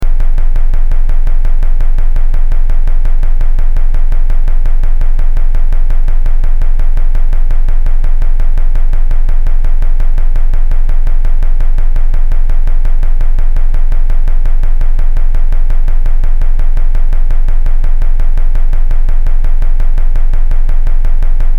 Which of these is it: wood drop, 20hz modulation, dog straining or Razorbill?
20hz modulation